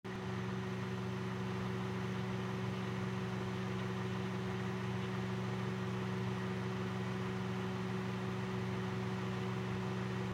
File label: microwave